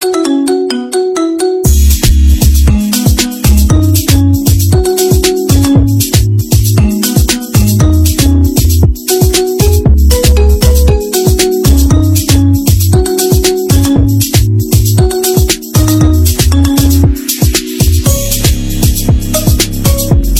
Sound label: Marimba